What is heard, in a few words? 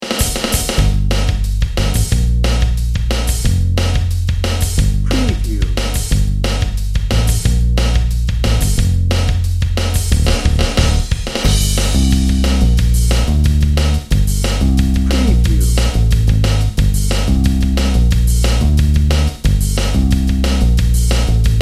dnb